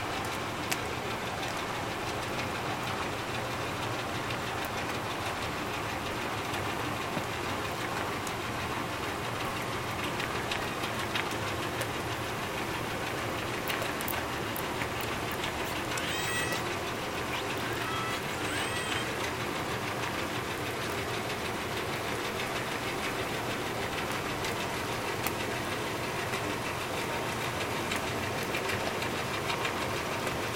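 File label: southbound bulker SRKWs; SRKW vessel case study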